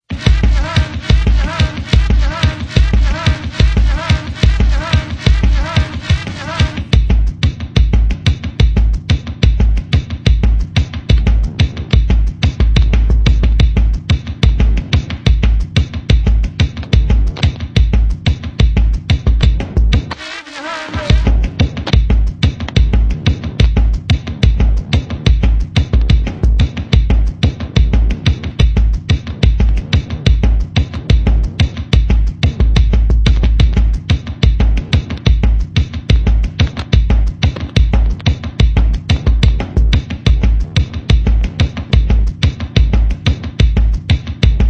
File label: beat; Liberation Technologies